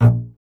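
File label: STR CBS, Solo Instruments, double bass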